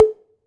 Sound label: Prepared Drums